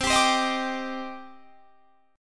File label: C Minor Add 9th C; strummed; madd9:1,b3,(5),9; JGuitar